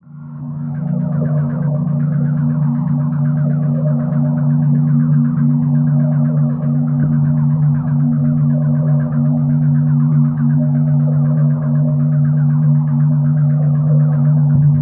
Drone Bed Pulsing Bass; Production Element Imaging; AdobeCC

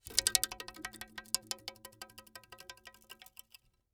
Foley Bamboo Stick Cinder Blocks Rattle

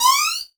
Animation Short; Adobe; Multimedia Internet CD-Rom Flash

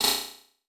Multimedia Internet CD-Rom Flash Radio Element

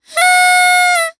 Cartoon Toy Noisemaker Honk; AdobeCC

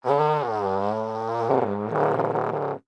Cartoon Trombone Fart

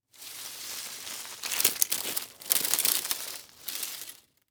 Impact Stick Drag Through Dirt And Rocks

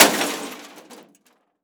Crash Glass Smashing On Metal Short; Crashes

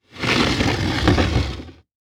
Movement Plastic Bin Slide On Cement; foley

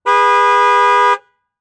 Transportation Chevy Trailblazer Honk Horn Medium

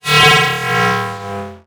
Multimedia Internet CD-Rom Flash Animation

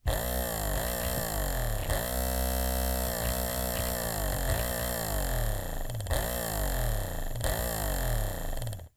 Electronic Servo Motor Pulsing; AdobeCC

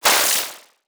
Animation Electronic

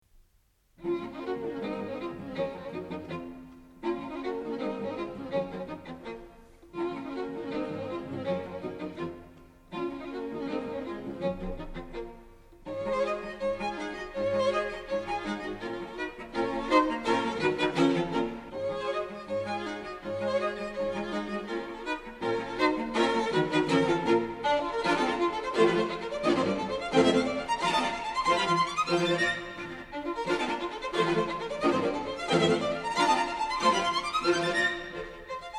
Presto; The String Quartets Ii

